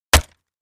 Crate Palette Type 1 Hit with Sledge Hammer

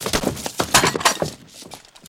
Body Fall Taking Obje; bodyfalls

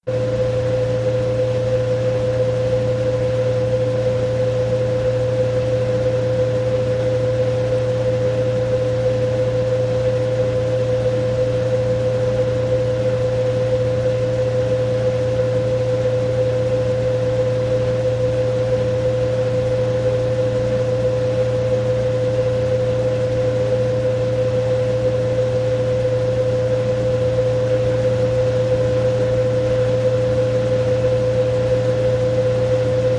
Wash Mach Spin Cycle, washing machine

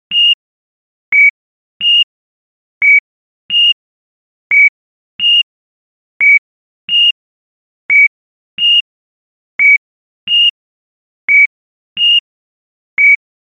Ticking Electronic, ticks

